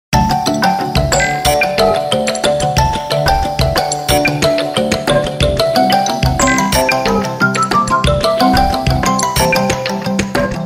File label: Toyrobot; Samsung Galaxy Win GT-I8552; S Youth Common